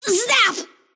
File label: lasthit pain; kidvoker; dota; invoker